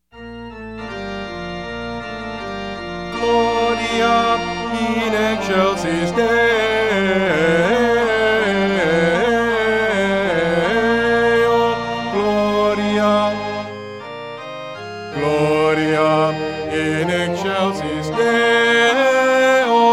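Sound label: Fanfare Christmas, Bass; Shaw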